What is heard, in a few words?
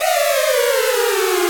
Sci Fi Raze, Effects